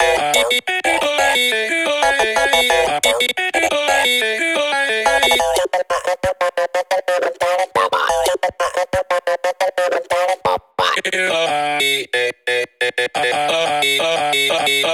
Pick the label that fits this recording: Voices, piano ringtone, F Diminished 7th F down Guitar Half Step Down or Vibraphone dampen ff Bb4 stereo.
Voices